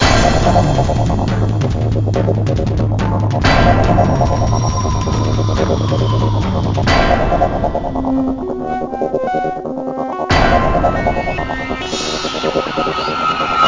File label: Loop Danger